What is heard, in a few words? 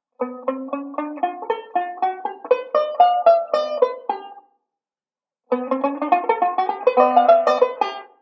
ii V Pattern, banjo